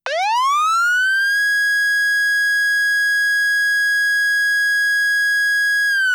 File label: Federal Signal Touchmaster Delta Airhorn